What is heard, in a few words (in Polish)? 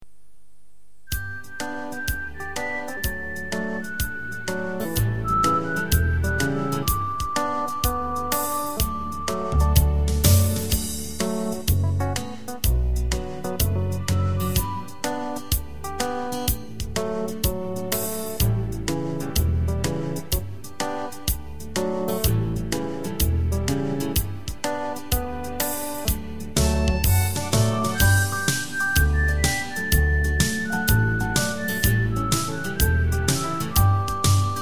wersja instrumentalna